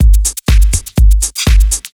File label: House Beat 122 bpm, drums; Loops; Ableton Live 12 Lite; Core Library